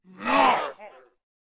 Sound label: Dark Peasant Attack Vocals